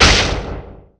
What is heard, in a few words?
hit; weapons